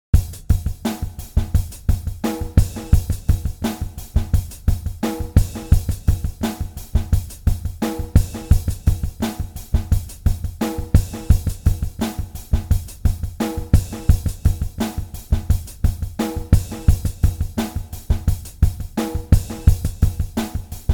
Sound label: clean with reverb and delay